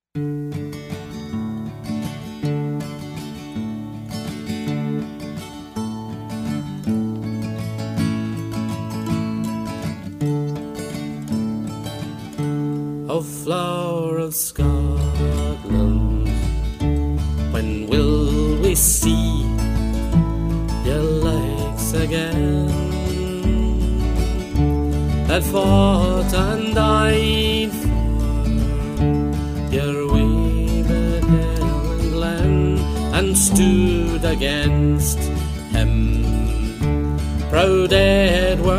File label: Irish Singer; Celtic Singer